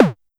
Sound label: Pollard Perc, Syndrum